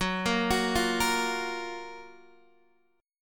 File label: F sharp Major 7th, Guitar, DADGAD